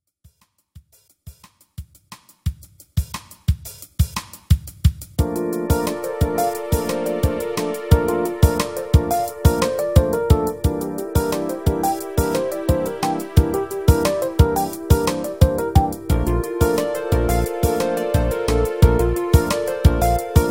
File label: groove